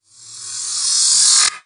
Reverse Cymbal; Vengeance Samples; VEH1 Cymbals

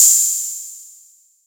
Open Hihat; Vengeance Samples; VEE2 Cymbals

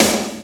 Snares; Vengeance Samples; VEH2

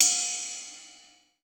Ride, Cymbals; Vengeance Samples

